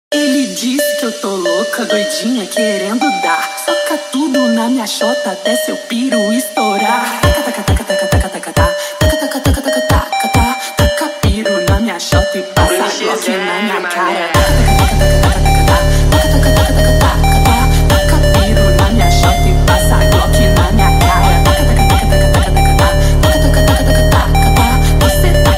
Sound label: Phonk Automotivo